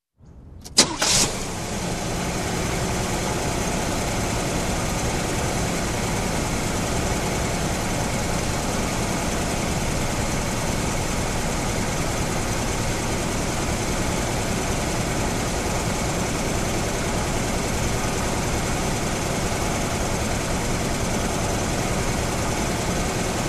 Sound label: Car engine ignition and running idle